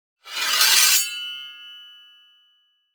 Medieval Foley Sword Drawing Unsheathe Shing Resonant; BOOM Library